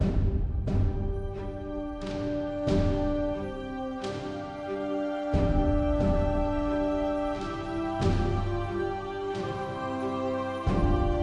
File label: World Stems Looping